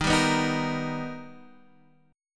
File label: B Augmented Major 7th Eb, chord